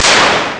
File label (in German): blitz; Amiga Soundtracker Sample Packs